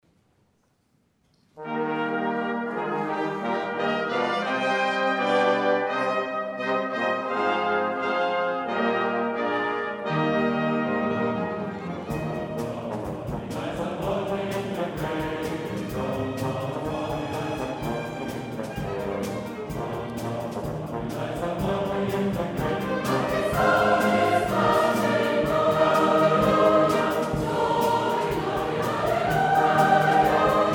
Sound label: Glory halleluja, Freizeitchor; Wildberg 2003